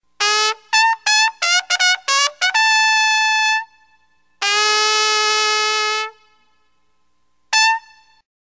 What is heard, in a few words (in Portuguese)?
olhar a direita